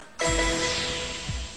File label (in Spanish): acierto